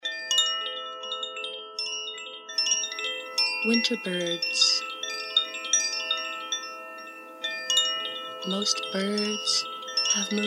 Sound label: winter birds flute haiku